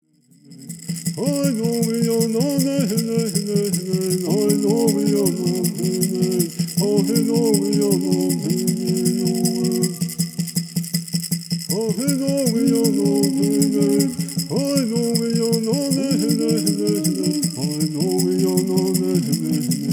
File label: Peyote Song